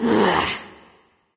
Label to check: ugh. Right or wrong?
Right